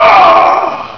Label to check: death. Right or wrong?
Right